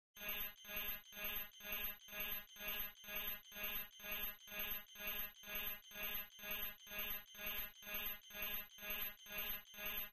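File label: Alarm Security Breach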